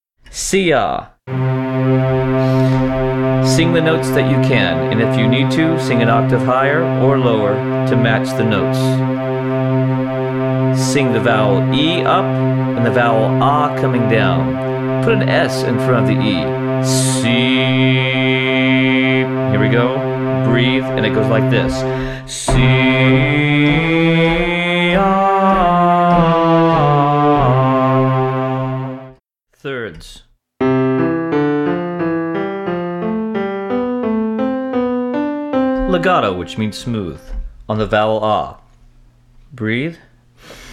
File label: vocal snippet